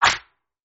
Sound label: Slap